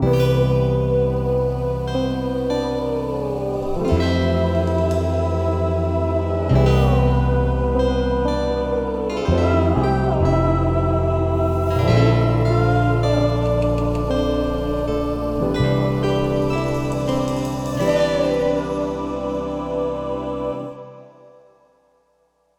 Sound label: Outro; Grindstone